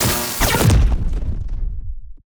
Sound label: Sci-Fi Electric Grenade Explosion